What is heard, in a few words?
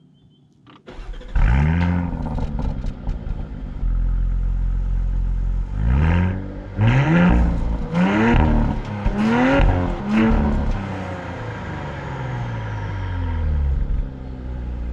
porsche cayenne s